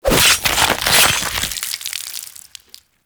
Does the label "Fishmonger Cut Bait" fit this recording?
Yes